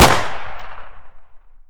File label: pistol loop, 9x19mm; Caliber